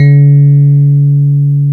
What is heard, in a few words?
KEY EP2 C 2, Roland, E.Pianos, Digital EP's